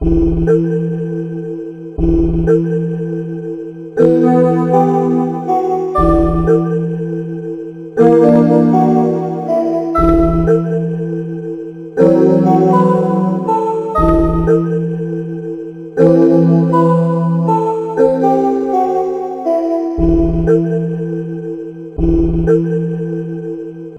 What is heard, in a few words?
ambient loop